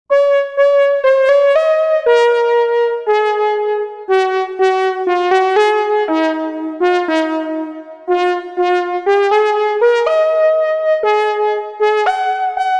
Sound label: PHM trumpet